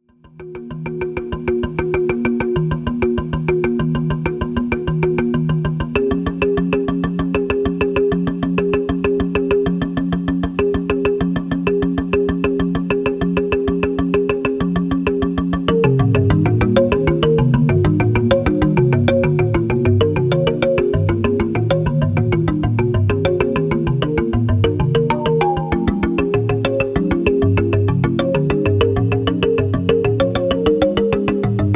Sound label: Ikebana Arpeggio